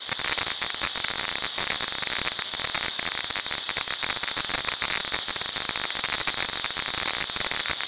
Army radio static

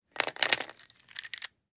Chalk box rummage; Chalk and Chalkboard

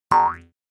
Cartoon spring boing jaw harp

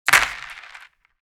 Board game map token throw; leisure

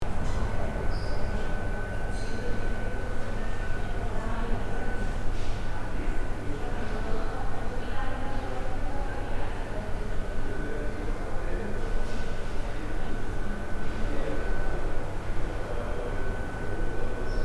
Hospital interior ambience quiet room tone

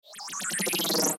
Line graph rising animation infographics

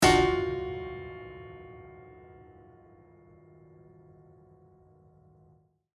Haunted piano string hit horror

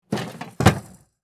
Tactical gear box close

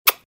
Kissing and smooching speedy

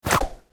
Vampire supernatural speed movement

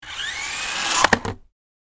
RC toy car remote controlled impact; rc toys

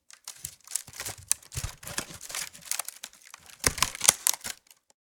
Hamper lid push creak and rustle; basket

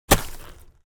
Shovel dig garden bed impact

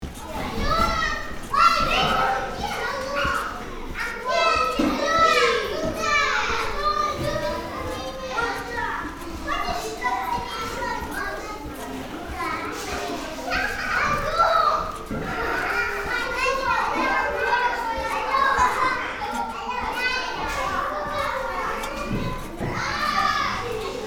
Soft play indoor kids rubber mat squeaks loop; playground

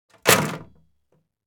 Small garbage bag objects throwing away dumpster; household

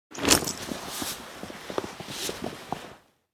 Military uniform cloth movement; Military Equipment

